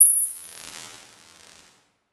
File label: Title Transition Electrical; Production Element Title Transition